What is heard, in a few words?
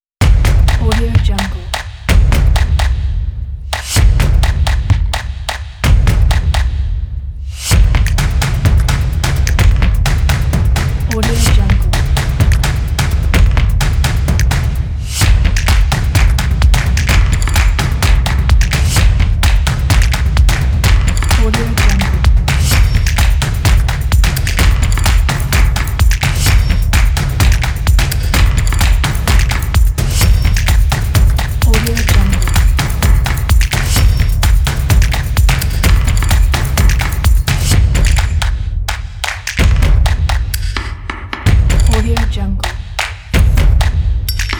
drums kit